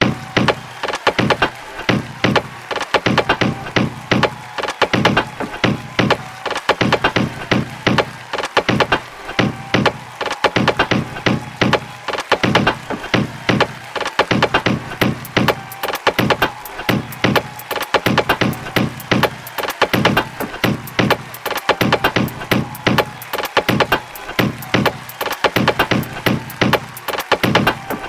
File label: 128 Bpm; Biosphere; loopy tunes vol 1 - professional breakbeat loop samples